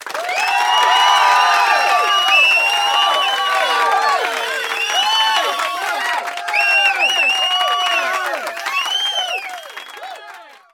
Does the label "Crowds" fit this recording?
Yes